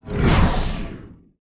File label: ui next area hint